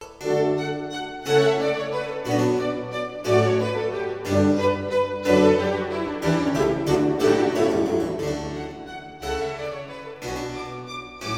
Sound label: Concerto for Harpsichord Strings and BC in C minor